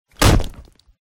ground punch clown; zoochosis; lazynap pack 2

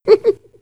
hunter pain short; huggy wuggy snpc; elmo

